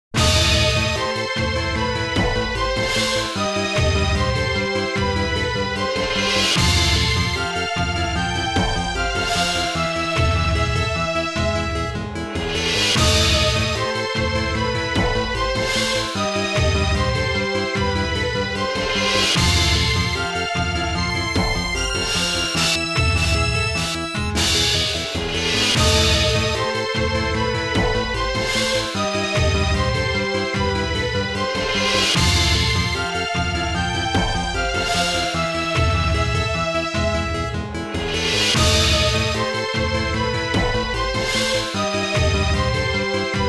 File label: Tension